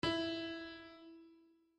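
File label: piano MI; bukvar; pianino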